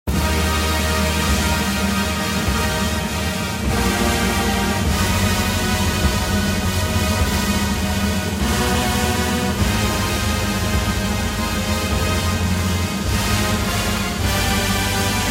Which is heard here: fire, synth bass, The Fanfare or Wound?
The Fanfare